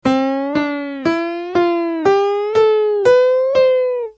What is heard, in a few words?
skala ping